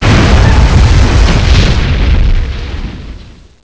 Collision, Explosion; NanoFXv2; ExplosionFX; ExpCollision

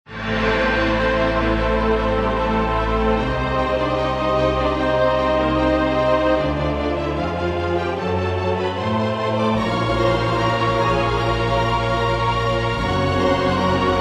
panic

